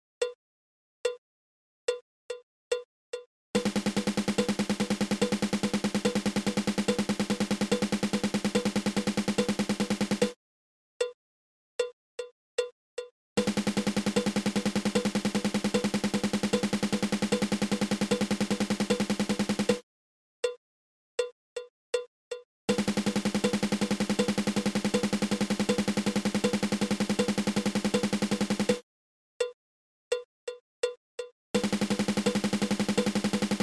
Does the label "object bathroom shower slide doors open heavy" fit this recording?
No